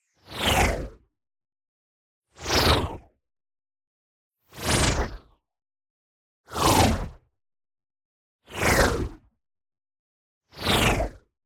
WHOOSH Noise Bubble Up; boom library; Anime Essentials

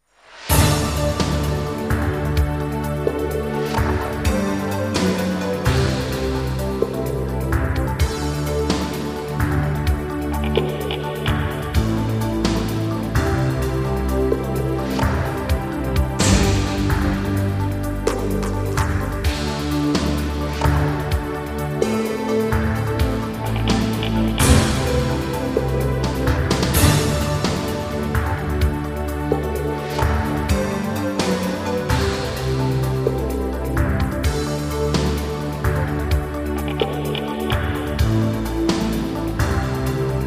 Jingles; 90 DDD Jingles

